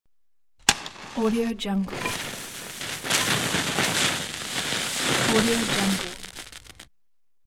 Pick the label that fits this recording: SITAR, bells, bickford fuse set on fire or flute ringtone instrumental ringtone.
bickford fuse set on fire